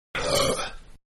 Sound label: Burp-Breathy; Burping; Human; Manhattan Production Music